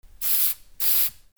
HOUSE

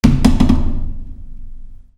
FALLING

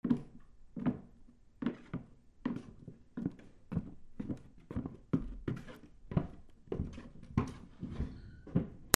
FOOTFALL

